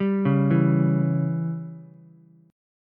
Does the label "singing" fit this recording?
No